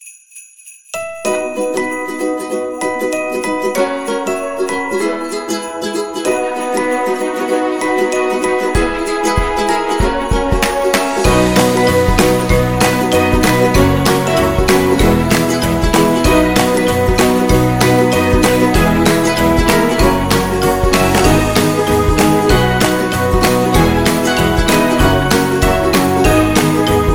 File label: Christmas Holiday Music